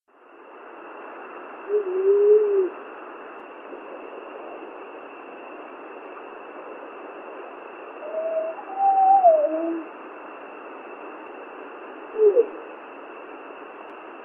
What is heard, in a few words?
Various Vocals